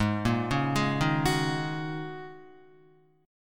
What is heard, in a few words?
G sharp 7th Suspended 2nd Suspended 4th, Guitar; G#7sus2sus4; 3,1,1,1,3,2; arpeggiated